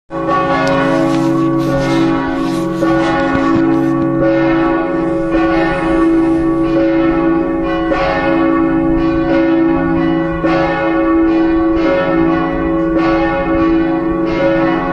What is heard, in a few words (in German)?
volles Gelaeut; Hamersleben